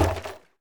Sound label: wood collision; Angry Birds